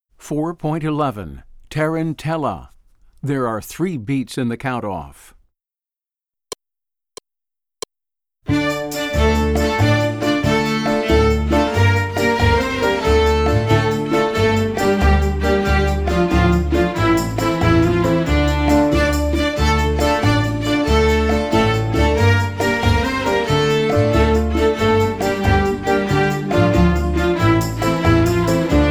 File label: Tarantella